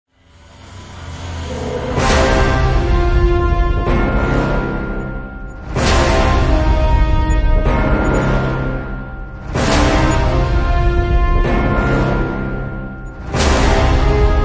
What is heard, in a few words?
No Vocals, Epic Action & Adventure